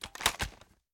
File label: inspect